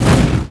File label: ROCK